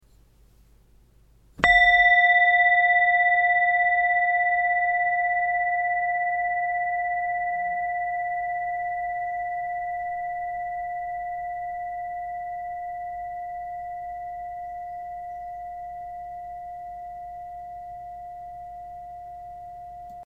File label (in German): Klangschale